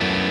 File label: F#m7(11)